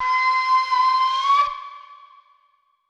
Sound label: flute, ethno, 125, A